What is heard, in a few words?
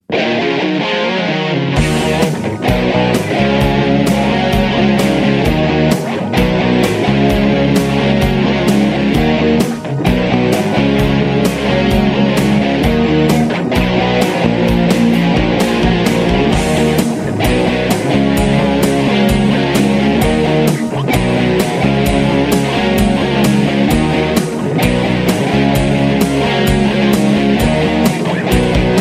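rock guitar without words; rok gitara bez slov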